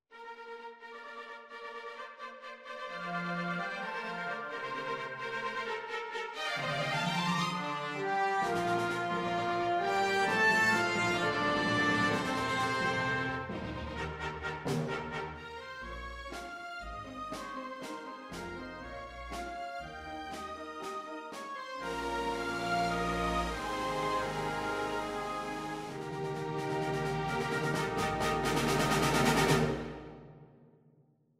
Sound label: Traffic Fanfare